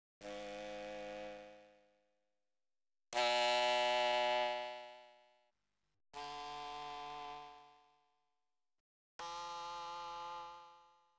Mellotron Oboe G#+3; Klaus Schulze Collection

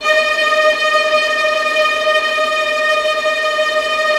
VIOLINT F, Synclavier Strings; ILIO

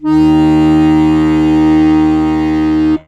HOHNER, akkordeon

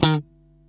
D#3, funky guitar

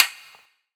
Beatbox Perc, Hybrid Beatbox Drums; Antidote Advent

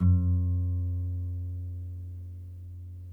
NYL NV G, nylon no vib guitars; Spectrasonics; Hans Zimmer Guitars VOL-1

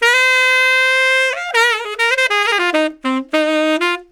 SAX LICK, BRASS LICKS; Ueberschall; Jam Box

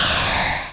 Noise Hit; noise hiss crackle; Noise Hits

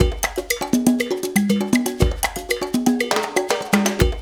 LOOP, Percussion

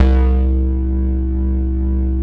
BASS, 160 BPM, Drum & Bass; Ueberschall; Drum & Bass Flash Trackz

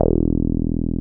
303 OW E2P, 303 OW BS; Drums In Your FACE!

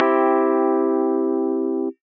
Classic Electric Piano Chord; Big Fish Audio; OSH Chords

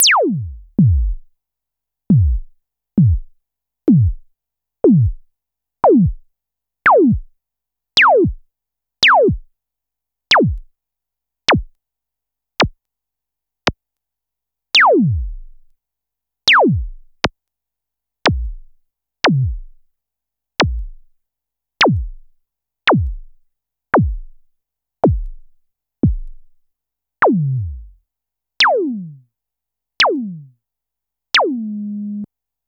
Korg Trident

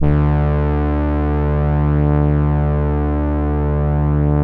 P5 BRS G2, PROPHET 5; Greytsounds VOL-1

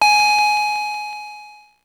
Drum, Electro-Harmonix Spacedrum; Drums

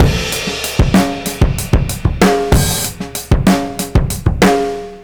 Funky Drums 95; musicradar

